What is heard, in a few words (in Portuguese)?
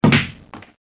sinuca